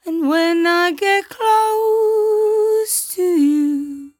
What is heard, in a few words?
57bpm Am, Velvet Vocal; Zero-G